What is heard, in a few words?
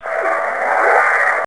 Noise; Revista CD Expert 50